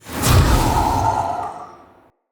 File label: Polluter Eye Restore; Props